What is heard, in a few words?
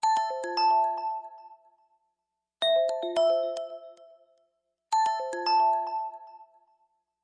windows phone ringtone